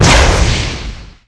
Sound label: flame, dragon